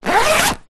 foley tent bag zipper

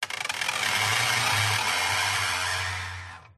Wood Stress Creak Squeaks; DPA4060; pmsfx

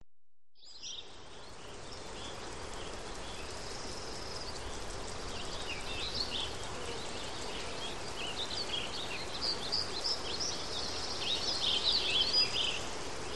Country Day; audio hero

